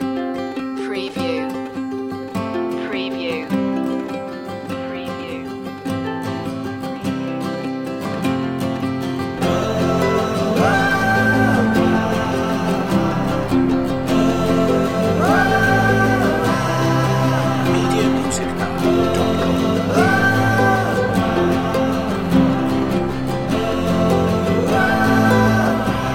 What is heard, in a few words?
the nu-folk tune